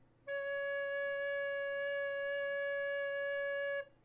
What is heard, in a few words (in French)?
Flute fermee